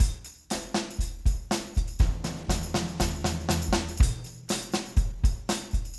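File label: beat 3 bar, Room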